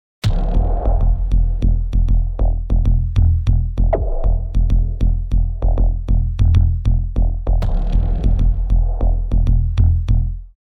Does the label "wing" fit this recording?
No